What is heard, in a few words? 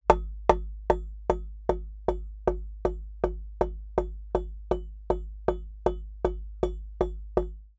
industrial impacts hammer wood fixed beam